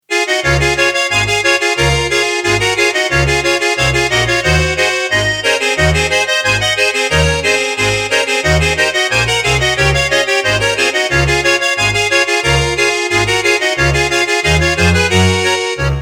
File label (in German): am Bass Db